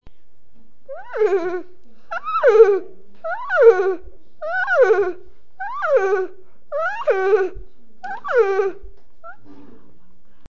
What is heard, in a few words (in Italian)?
cavallo, è il suono del cavallo e che va su e giù